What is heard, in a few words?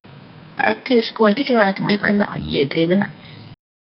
teenage laughter